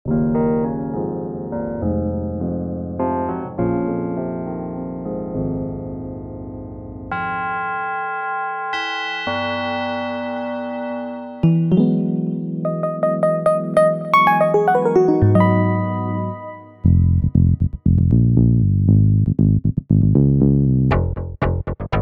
fm dx

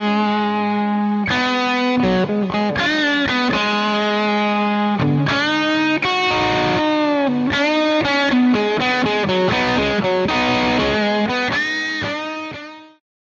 guitar; booster cataclysm

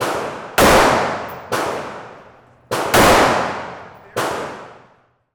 street shoot out gun range

